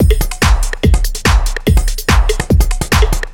Dance Drumloop; Drums; Dance Beats

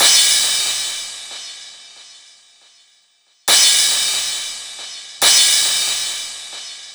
Cymbal Loop, Dance Beats; Drums

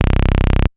Synth Bass Single Note; Basses

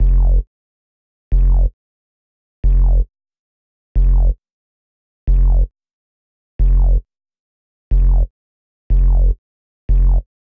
Synth Bass; Basses

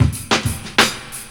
Old School Break, Drums

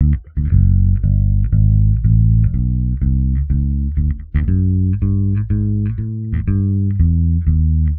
Electric Bass; Basses

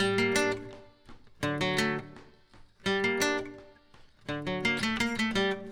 Acoustic Guitar; Guitars

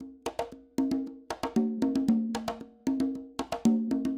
Acoustic Percussion

